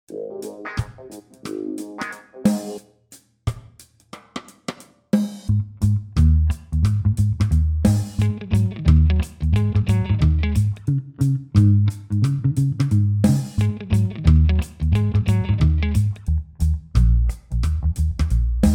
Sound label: Reggae Loop